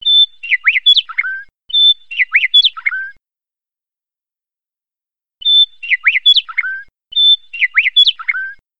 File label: Bird